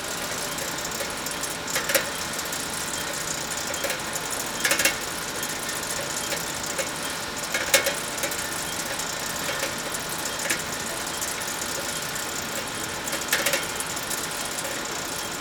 conveyor, factory sounds; svencoop; mustard

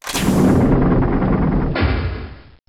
lift gate; svencontra2; stage2

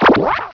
step, gonarch; svencoop; cracklife

